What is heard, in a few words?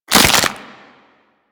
Stealth Neck Snap; Warframe; Characters; Tenno